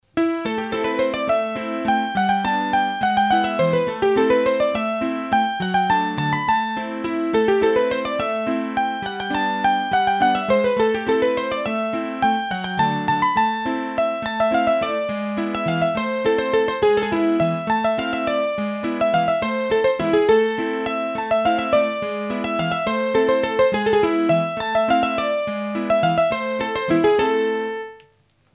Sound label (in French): Bourree, hurdy gurdy; Massif Central; Bourrees a 3 Temp